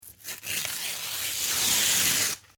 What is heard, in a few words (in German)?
office papier zerreissen